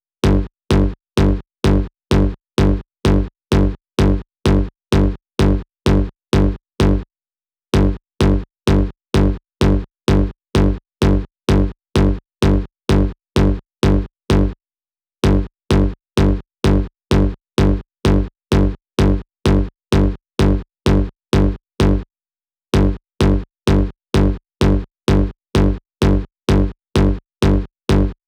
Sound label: 128BPM Bass off, Root G; Vengeance - Essential Clubsounds Vol.5; Early Morning Kit; Bassline